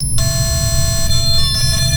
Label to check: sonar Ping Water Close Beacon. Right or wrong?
Right